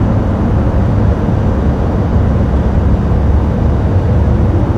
AMB Int Space LG; Duke Nukem Forever

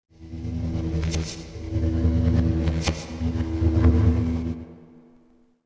Goodyhut Electric; Ambient; Sid Meier's Civilization 4 Complete; Beyond the Sword

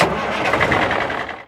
select vehicle; Hearts of Iron IV Death or Dishonor

